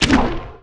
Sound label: crossbow; Weapon